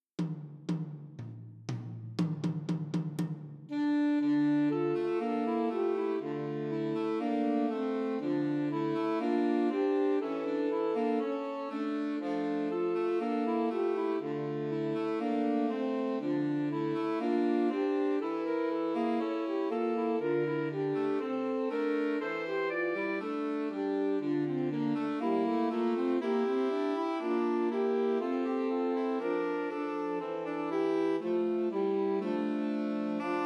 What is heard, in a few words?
Trios for Two Altos and Tenor Saxophone; Christmas; God Rest Ye